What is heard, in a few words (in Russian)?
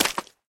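быстрый уверенный шаг по грязи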